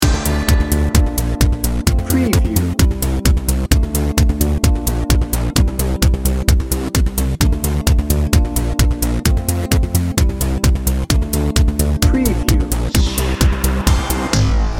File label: party edm loop